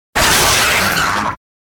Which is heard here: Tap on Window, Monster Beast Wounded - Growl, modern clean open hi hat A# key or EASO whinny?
Monster Beast Wounded - Growl